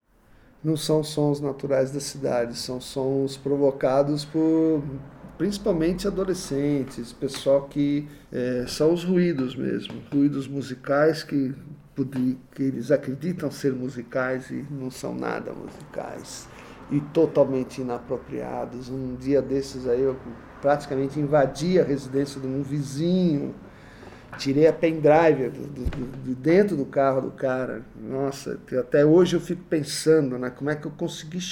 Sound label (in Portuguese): Musica Alta